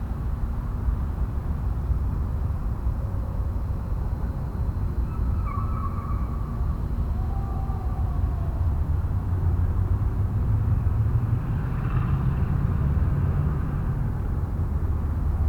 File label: Urban night dog horn traffic echoes; urban and suburban; boom library